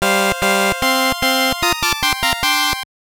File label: Jingle; Jinsei Game Densetsu; GB